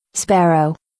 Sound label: sparrow; wild birds